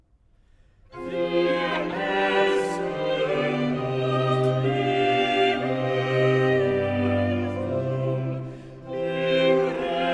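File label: soprano alto tenor bass, cantatas; BACH; THE EARLY CANTATAS; BWV004; Christ Lag in Todesbanden; Wir essen und leben wohl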